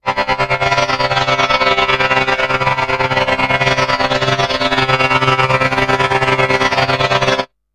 Emu EIV classic und Ultra Granular synthese Aux Env Dec

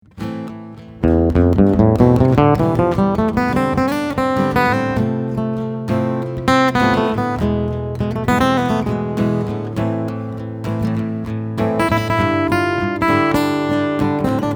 Phrygisch über A Moll

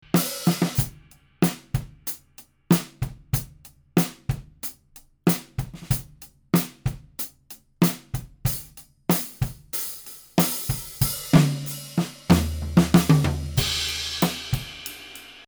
DPA 4060 Drums Weniger Stereobreite

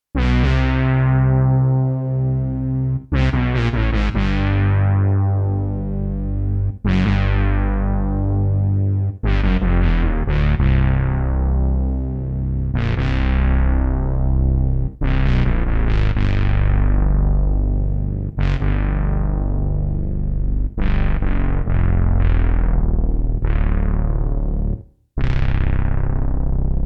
Tief runter

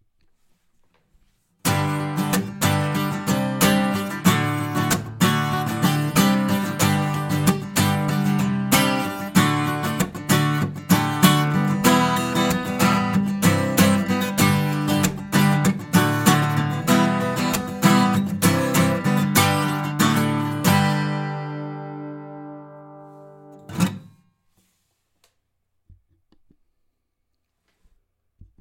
Akustische Gitarre

